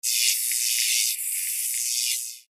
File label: sharp current sound